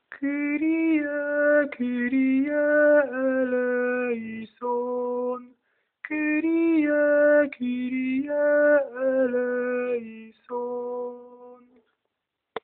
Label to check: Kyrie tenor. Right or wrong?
Right